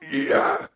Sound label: Wound; Voljin